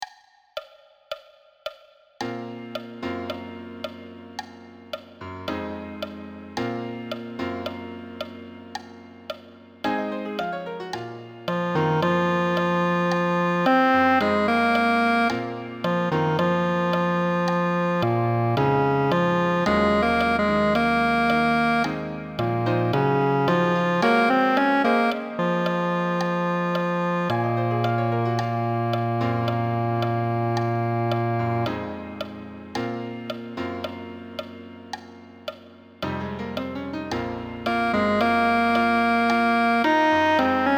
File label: click track